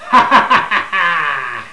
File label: Evil Laugh; Elakt skratt